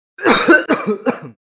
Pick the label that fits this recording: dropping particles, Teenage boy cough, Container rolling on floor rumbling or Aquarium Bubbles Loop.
Teenage boy cough